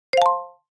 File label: Alert notification